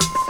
HH, Dance Mega Drums; Best Service; HH HIP

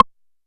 Side Stick Ana, YAMAHA RM1X

